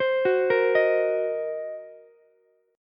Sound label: C Minor 7th Flat 5th C down Ukulele; arpeggiated; JGuitar